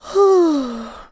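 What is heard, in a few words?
long gentle sound of a yawning woman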